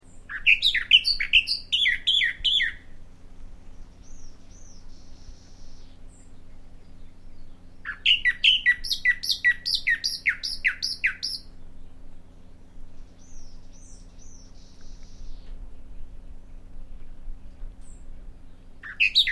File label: Bahama Mockingbird, songs